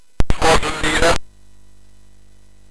Jump Jet, sid MOS6581R4; hvsc

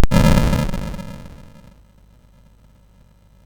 Chopper, MOS6581R4

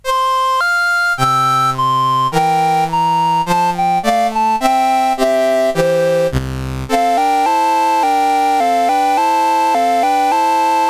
Canzone di Doretta, CSG8580R5

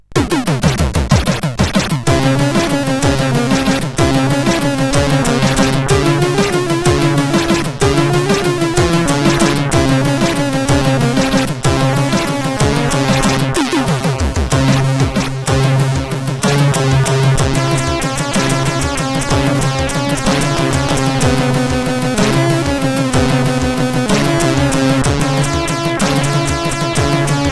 Iranian Music, sid CSG8580R5

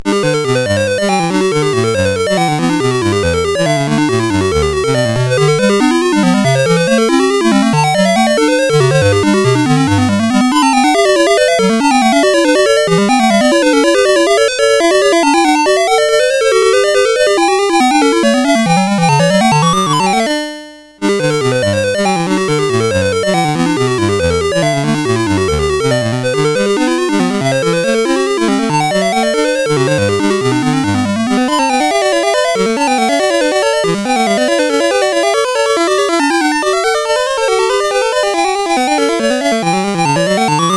Goldberg Variations parts 8-13 sid CSG8580R5; hvsc

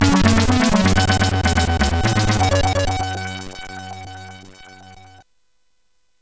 Jingle CSG8580R5

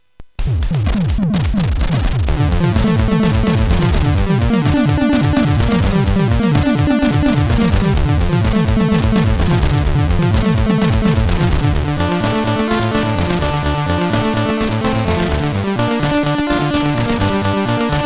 Knock Remix sid CSG8580R5

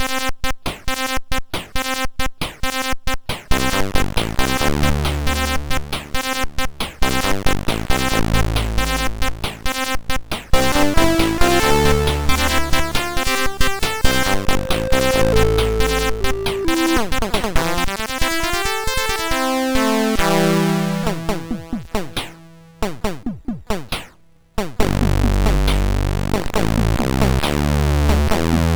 Sound Sample, sid MOS6581R4

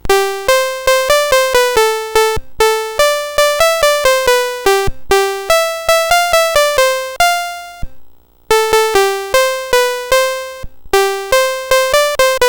Xmas Card BASIC sid CSG8580R5

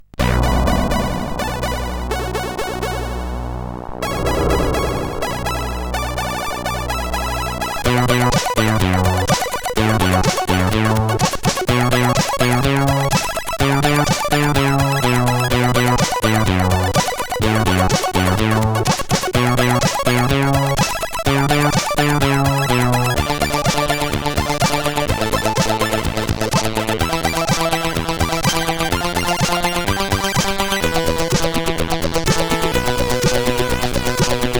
Mars Disco, CSG8580R5

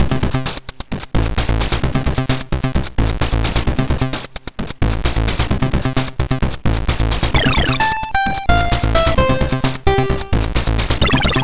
Dance Sample, MOS6581R2

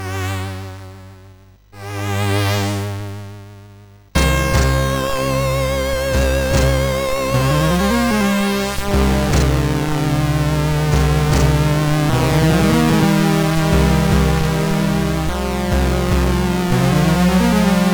Loader, CSG8580R5; Ivanhoe

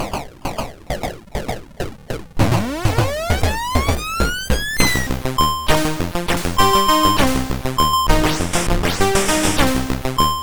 Synth, MOS6581

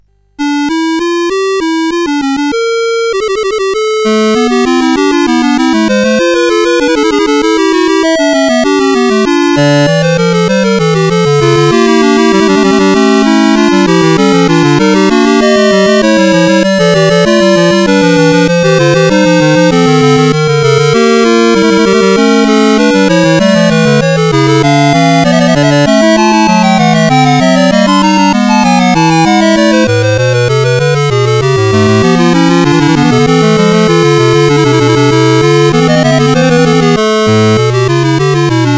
Fugue, CSG8580; CSG8580R5

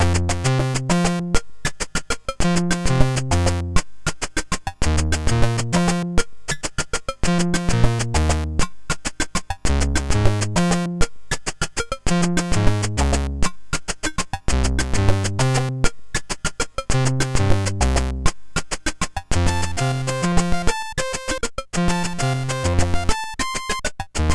Latin, MOS 6581 R3

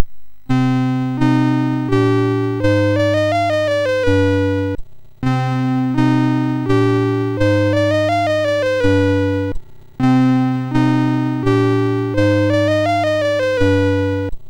Renaissance sid MOS6581R2

